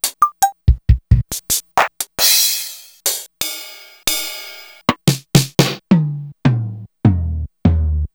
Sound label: Sakata DPM48